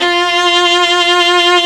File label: STR SECT F3; WS#3 BAROQUE; AKAI S-Series CD-ROM Sound Library VOL-2